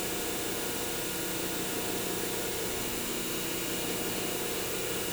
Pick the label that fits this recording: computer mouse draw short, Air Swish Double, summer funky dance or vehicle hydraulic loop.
vehicle hydraulic loop